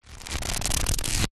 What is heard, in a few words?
household book pages flick fast; zapsplat